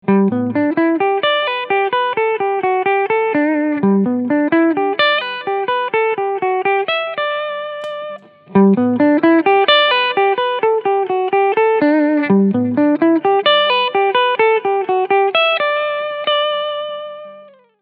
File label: Source Audio ZIO Low Cut Clean Sound